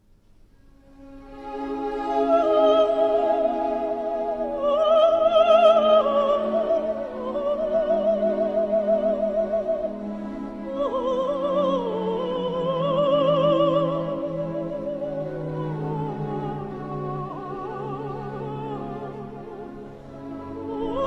vocalise; rachmaninov

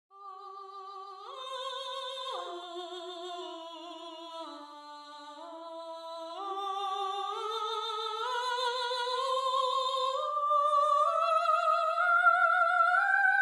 Soprano

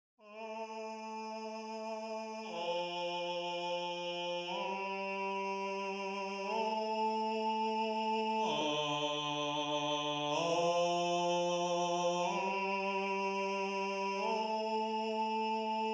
Bass

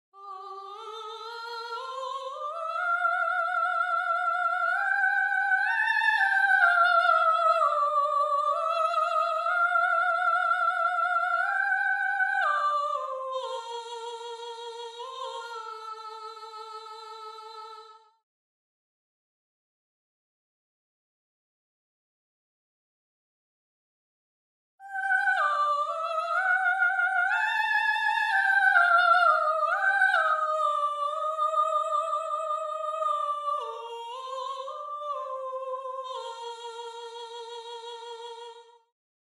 Soprano

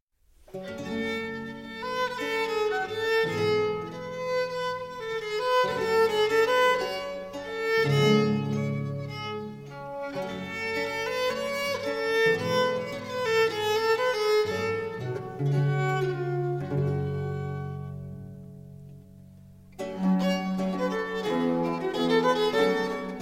Ricercare G-moll; Diego Ortiz

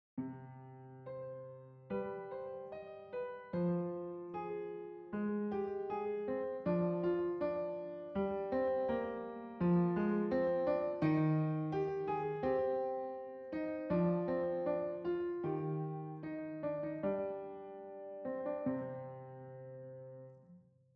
Piano

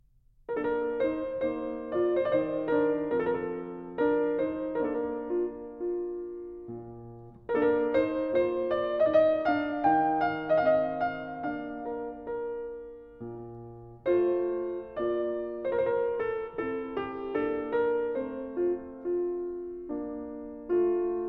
Capriccio in B-dur - Arioso; Bach; BWV 992; Free-form

